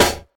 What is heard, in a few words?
smooth snare drum sample G sharp key, acoustic snare tuned to the G# note